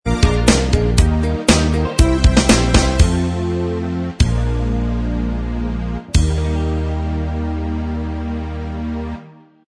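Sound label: kids tv theme Instrumental Track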